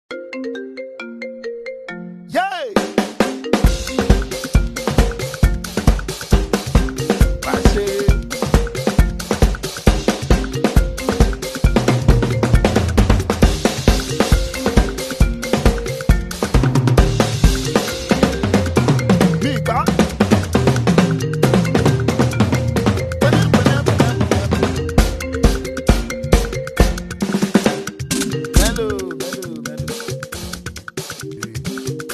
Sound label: Fuji Tungba; Iphone